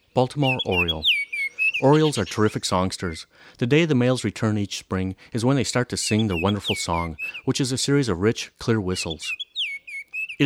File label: Baltimore Oriole